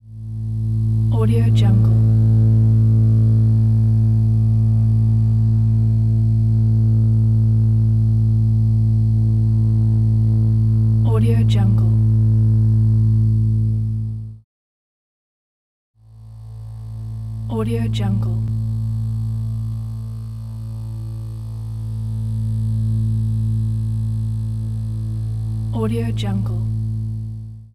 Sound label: electric hum